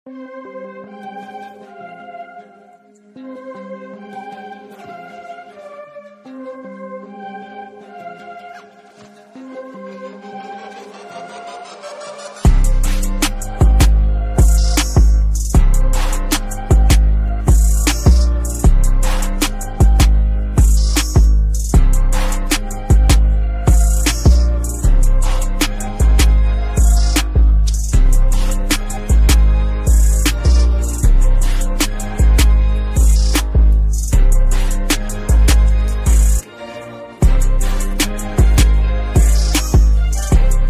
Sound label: Instrumental